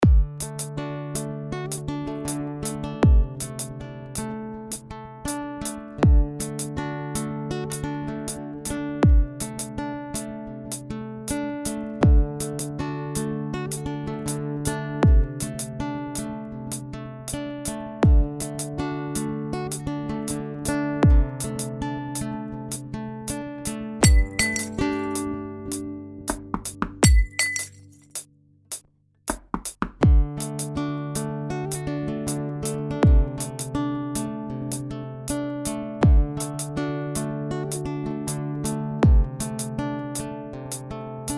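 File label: morning wine guitar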